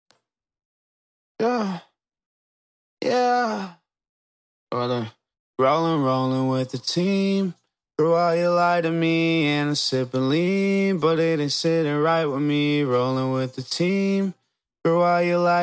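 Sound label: hip hop pop 140 GM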